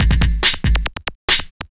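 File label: DRUMLOOP ELECTRO 140; Techno2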